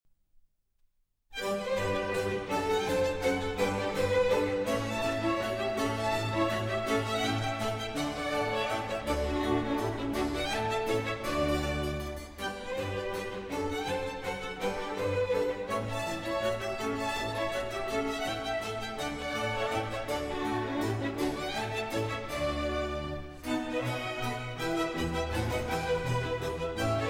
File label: Concerto for Strings and Continuo in G major